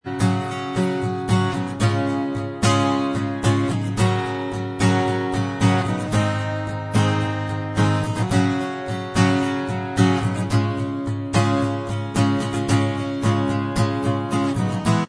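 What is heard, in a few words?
acoustic instrumental, backing track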